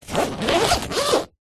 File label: foley luggage bag fabric small zipper short; zapsplat